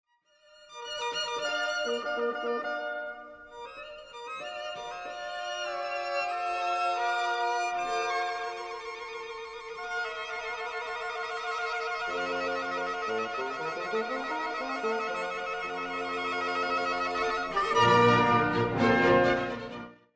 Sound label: trill; haydn